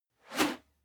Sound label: whoosh whistle